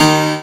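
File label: piano A#, FLUEGEL BASS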